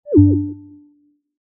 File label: fall